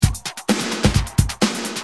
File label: Tight, Drums Perc; Industrial